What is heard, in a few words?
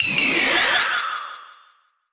Jingle, Fever